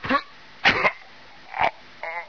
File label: die, hgrunt; Source Engine; bshift